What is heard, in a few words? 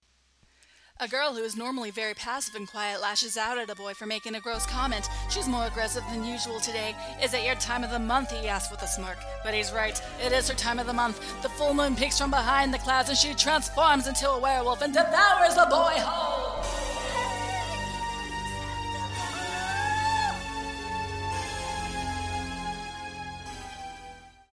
Werewolf Female; Request FSA; #2spooky